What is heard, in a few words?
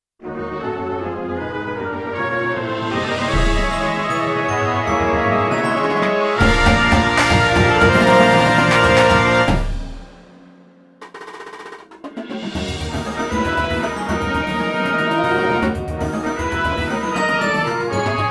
racecar, marching band